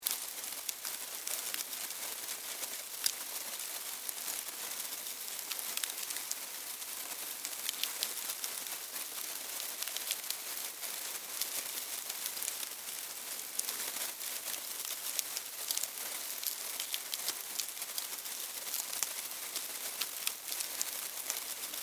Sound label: Animal, Termites, Hive, Eating, Movement, Insects, Bugs; Surround Sound; 5.1; BlastWave